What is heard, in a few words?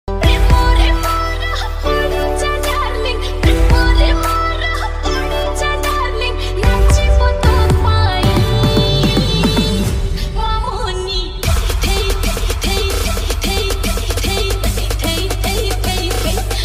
Odia Dj Ringtone